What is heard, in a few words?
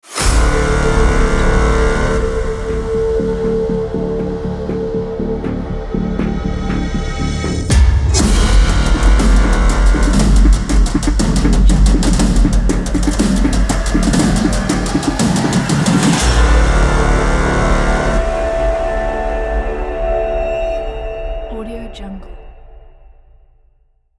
hybrid logo